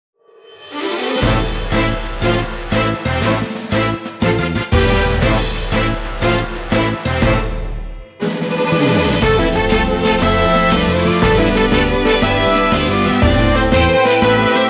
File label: Music Success event